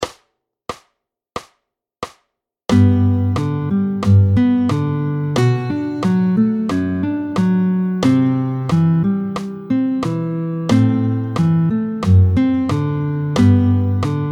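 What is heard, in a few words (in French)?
Vite tempo 90, L'anatole, Vite, tempo 90, guitare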